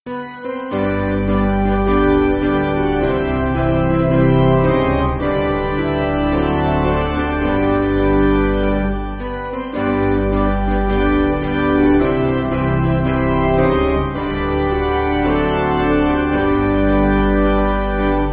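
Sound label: Organ Piano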